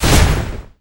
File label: projectile launch; Batrider